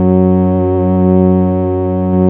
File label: PAD ZEN